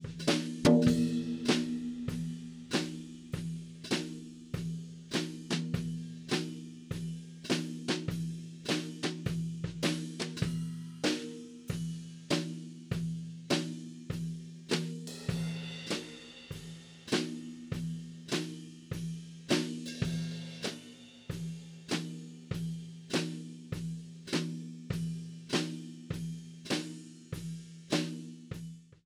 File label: Tom